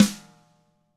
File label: TAMA SNRD, TAM HIT; ILIO; Double Platinum Drums 1